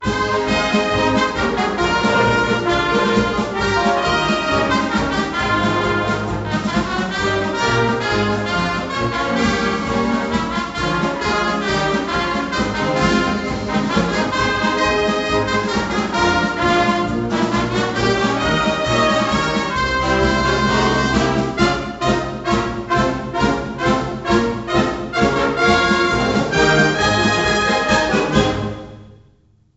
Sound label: Fight Tune; NCAA March Madness 99; PS1